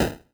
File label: mage hit; Incantation